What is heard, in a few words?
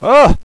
aah; bot; cooked